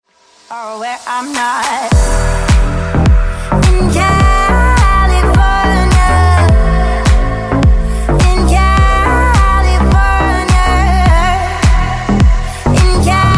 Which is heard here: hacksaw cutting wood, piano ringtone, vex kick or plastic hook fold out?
piano ringtone